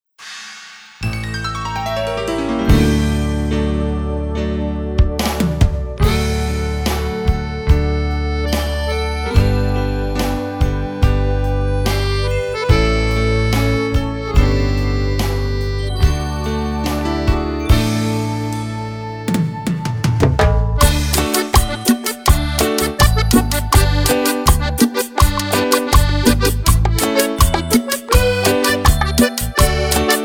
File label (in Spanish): Versión Cumbia